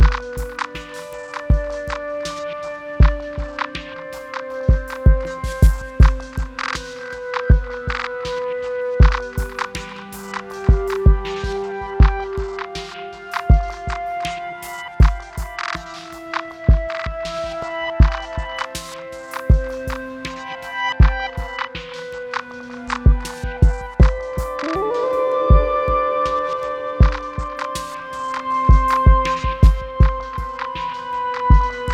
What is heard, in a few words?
Music Loop; Decoder; Jettomero: Hero of the Universe